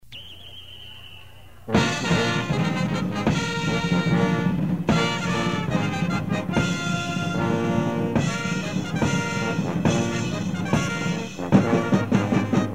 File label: Fanfare; The circus performance was recorded by Robert P. Hills, Jr. on August 1, 1973